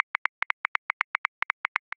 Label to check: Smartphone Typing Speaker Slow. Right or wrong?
Wrong